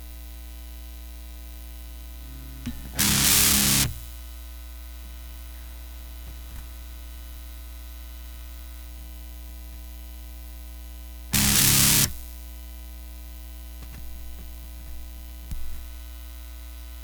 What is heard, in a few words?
static